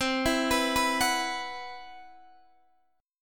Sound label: C Major 7th Flat 5th C, Guitar Open D